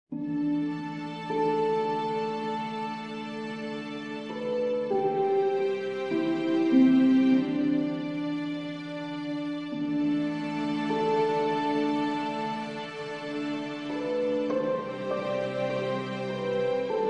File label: Taiko